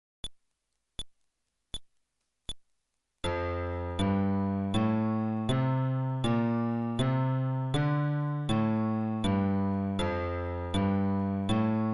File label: Scale テンポ80, fretless bass